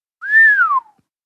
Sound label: Lure Whistle